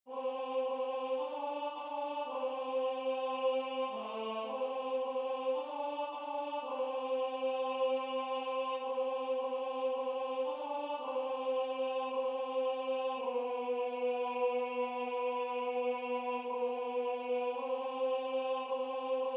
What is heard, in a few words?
Tenor